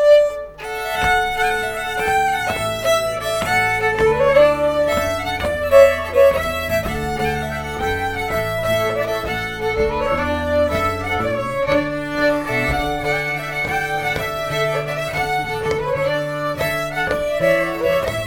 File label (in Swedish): Pols; efter Kristaffer Tröen